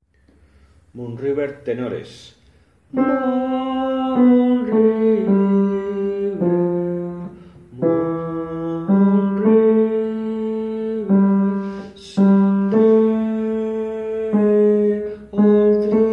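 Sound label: tenor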